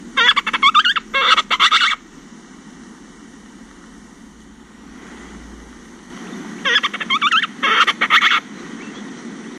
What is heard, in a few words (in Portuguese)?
Pedreirinho